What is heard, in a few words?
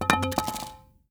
Arrow Fall Ground; timelock vr